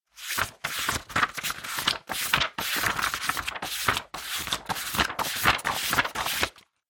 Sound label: Book Flip